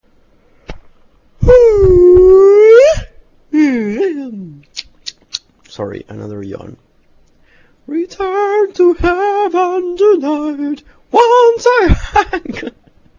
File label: yawn